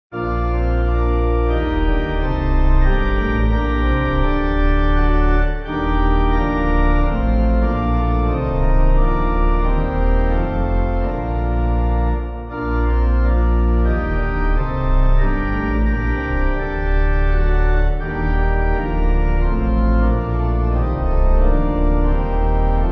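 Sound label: Pipe Organ; Let Thy Blood In Mercy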